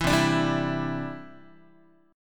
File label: C Major Add 9th, Guitar; strummed